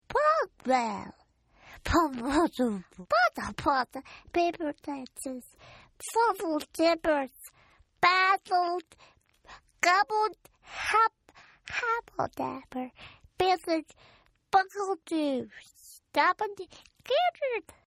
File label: Baby Gibberish; Talking; Sound Effects:Voice; Partners In Rhyme